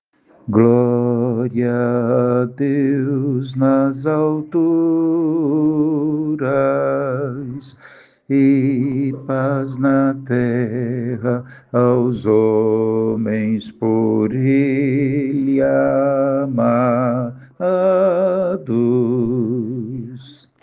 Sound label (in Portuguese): gloria contralto; coral sao francisco; missa vigilia pascal